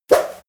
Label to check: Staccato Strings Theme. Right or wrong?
Wrong